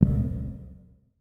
足音; LcdDem SFX

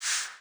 足音; LcdDem SFX

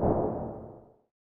足音; LcdDem SFX

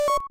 足音; LcdDem SFX

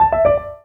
キャンセル; LcdDem SFX

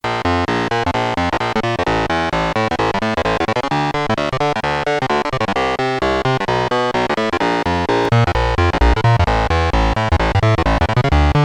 太いサウンドと効果的なリバーブ; ニコニコ動画

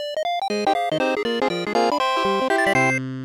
勝利 boss

